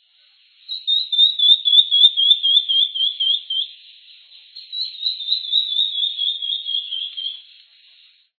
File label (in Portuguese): vira folha de peito vermelho